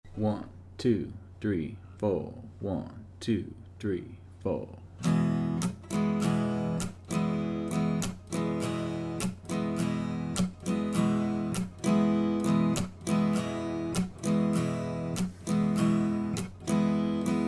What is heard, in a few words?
strum